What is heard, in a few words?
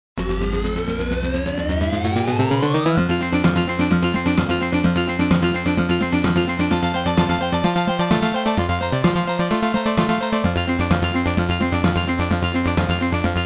Boss BGM; Konami Shooting Collection; Aircraft Carrier; Gradius